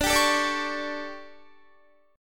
D-Major 7th Suspended 4th, D, Guitar, 4E, 4A, 5D, 5G, 5C, 6F; strummed; 22,22,19,19,19,20; chord